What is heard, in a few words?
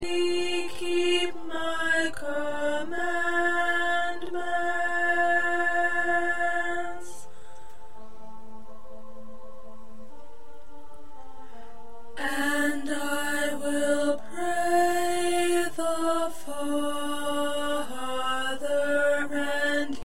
Alto